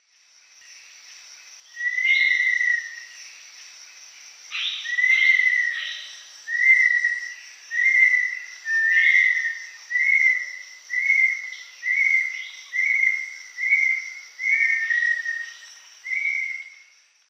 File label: Crypturellus soui, song; S. America; Eastern Lowlands